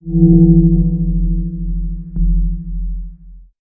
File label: KI Marker Tag Marker Status